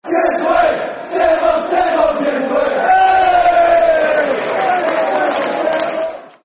Simmo Give Us A Wave [Football Chant (Soccer Songs)]; Preston North End Pne